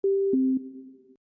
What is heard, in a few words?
new Message